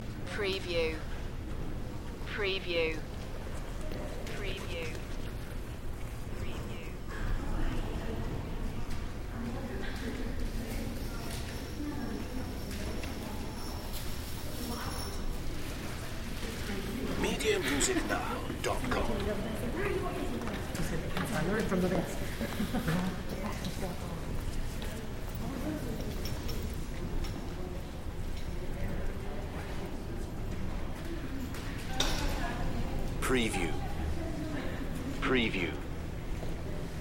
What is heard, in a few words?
Library Interior, Light Crowd Walla In Library Hall